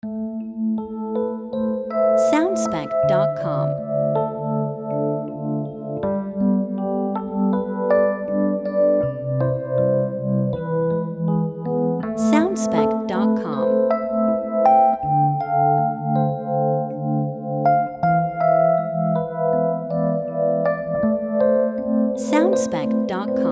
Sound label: Sentimental Rhodes